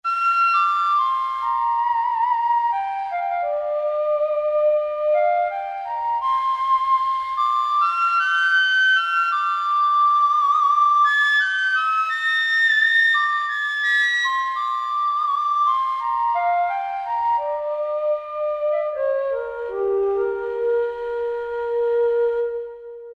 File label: WIND - KULUI FLUTE; Spectrasonics Heart Of Asia